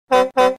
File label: truck